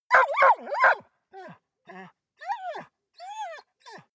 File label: dog bark whine; natur-tiere; hunde